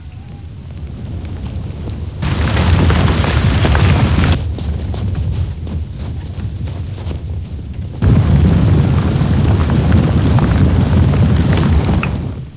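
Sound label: running in the rain; ziranjie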